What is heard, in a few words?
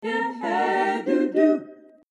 115 Pop Harmony